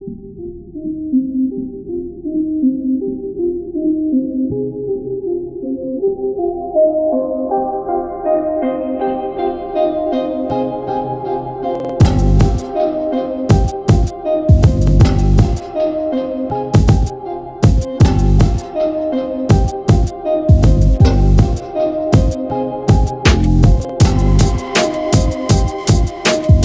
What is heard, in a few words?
hiphop; godot project; first anniversary godot jam